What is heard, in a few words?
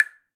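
Wood Block High; Latin Percussion; Drum Hits; Ableton; Factory Packs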